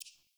UI Default Theme Focus

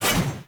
Sword Whoosh Heavy

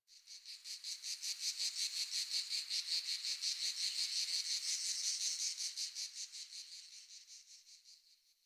Insect Day; Warframe; Ambience; Eidolon Plains; Eidolon Landscape; One Offs; Insects

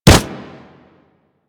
Kubrow Run Snow, Footsteps; Enemies; Warframe

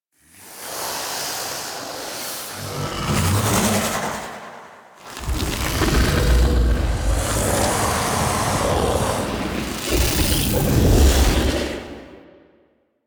Death Vocal; Warframe; The New War; Archon Death Nira

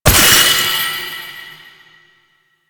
Horse Racing Orb Hit; Duviri

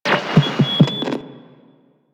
Radio Static Start A; DE; Warframe; Night Wave

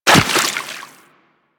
Walk Water, Footsteps; Warframe; Operator

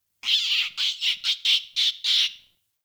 Witch Station Rat Chatter; Ambience; Soul Plane

